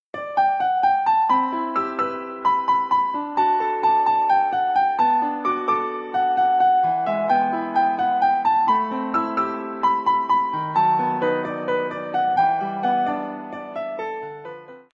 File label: Piano